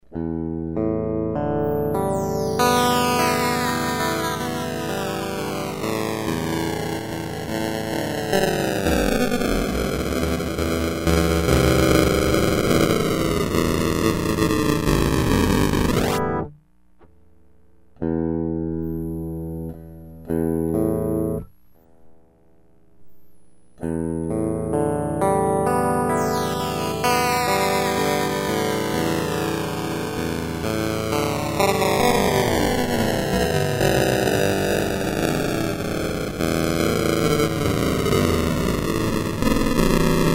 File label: guitar